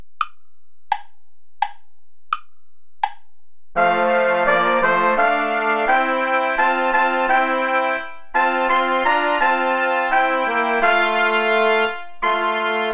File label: play along without trumpet; lord sing with lips and heart